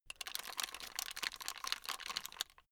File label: plastic rattle fast